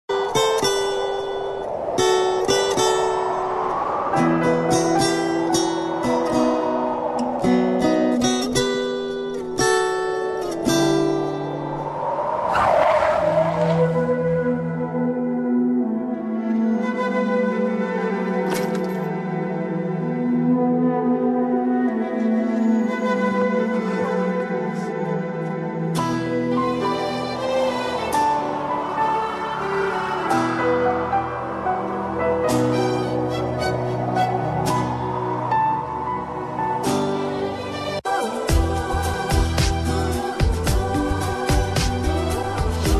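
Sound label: background Tune; Asad Zoya; Tv Serials; Zee TV; Qubool Hai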